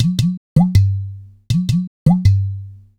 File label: Udu